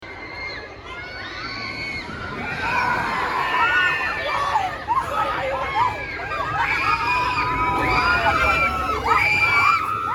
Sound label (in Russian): park attrakcyonov shum